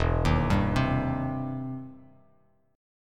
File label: E Minor Major 7th Sharp 5th, E, down, Bass Standard; EmM7#5 arpeggiated